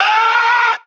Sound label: almost screaming; Notes